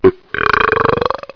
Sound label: Loud Burp